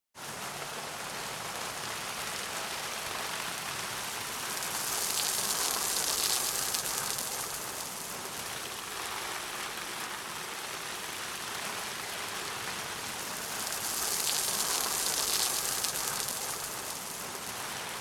Garden watering plants or flower bed water sprinkler